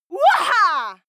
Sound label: female cheer